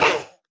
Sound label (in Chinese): 犰狳受伤声